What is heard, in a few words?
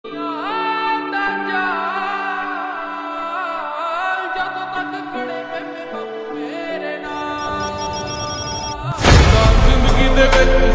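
slowed reverb lofi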